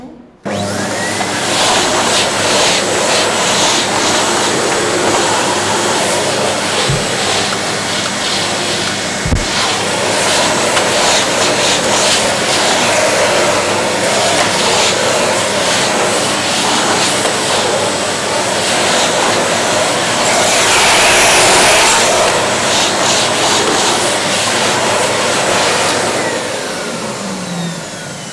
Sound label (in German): klingelton; niederberger